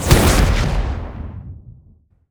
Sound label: FTL enter; starruler